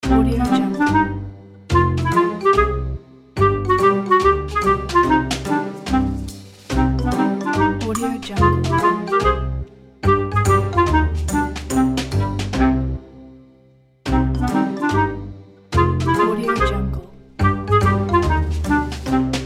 sad comedy logo

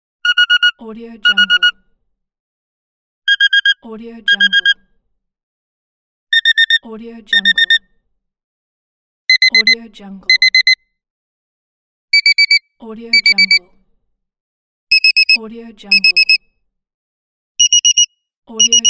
alarm clock

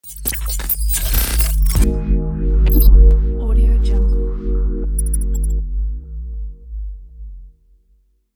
glitch logo

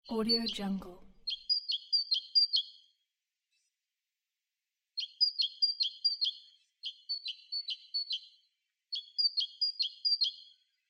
birds

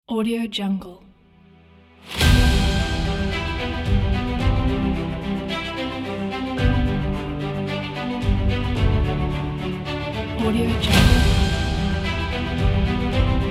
uplifting inspiring epic

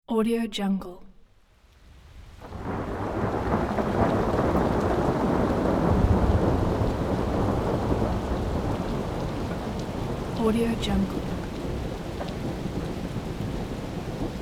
soft rain with thunder

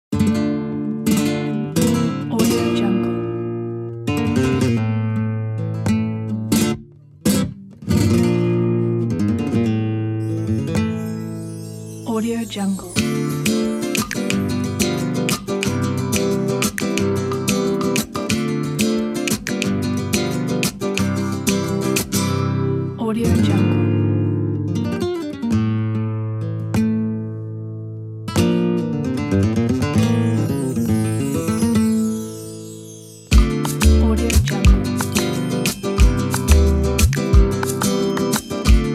flamenco

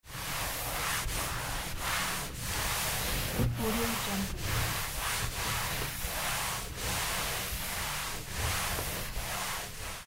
wiping towel table

